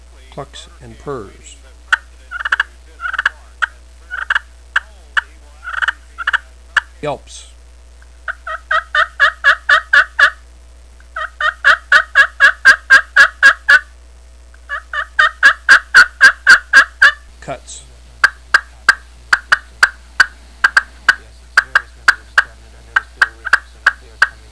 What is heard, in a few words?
primos batte y all